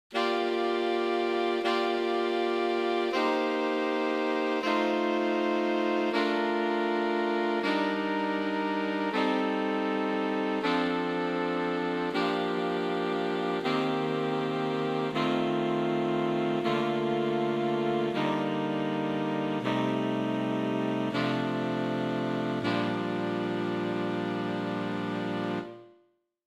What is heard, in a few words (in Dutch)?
Schuivende toonladders